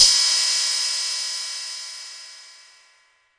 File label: 606 cymbal, drum machine; 606 Basic